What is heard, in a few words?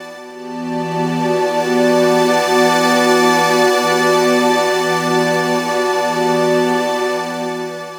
Pad, Synthesizers